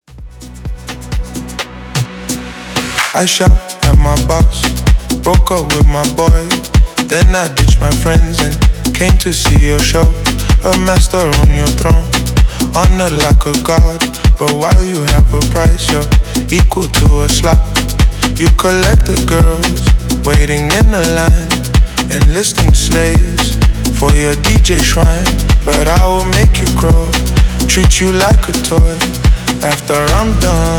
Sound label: AFRO BEAT